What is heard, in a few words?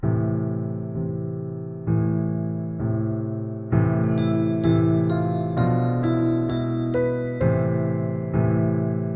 spoooooky halloween music